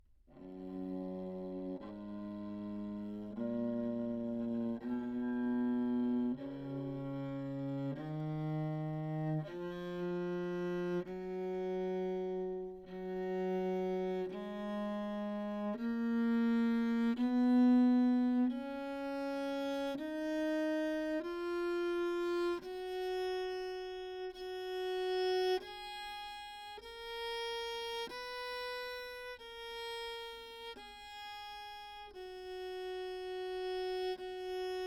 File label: tenor viol back microphone; Anechoic; OPENAIR